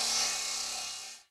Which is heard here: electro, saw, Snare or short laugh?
saw